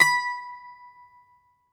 Greek Bazouki, plucked strings

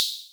Cymb, Clavia Micro Modular

